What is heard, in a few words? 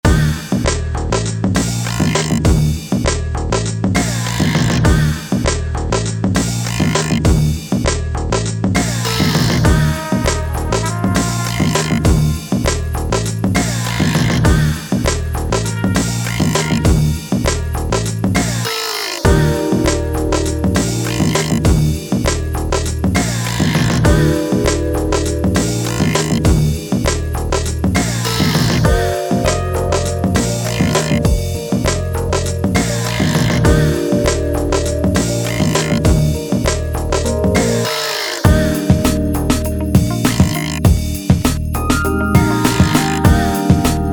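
instrumental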